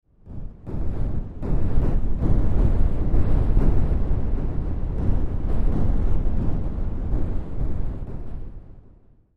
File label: WW2 Explosions A War Torn Land, Battlefield